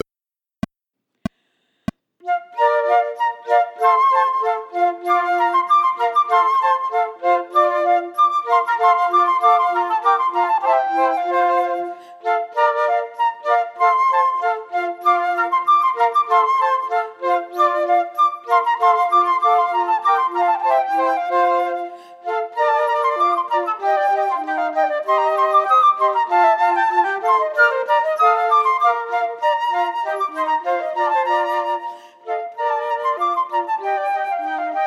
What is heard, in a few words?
Gavotta minus 3rd part; Corelli